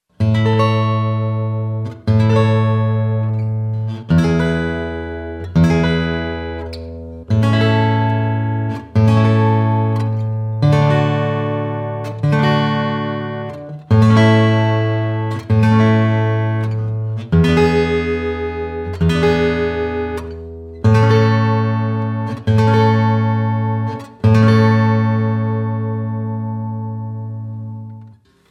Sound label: guitar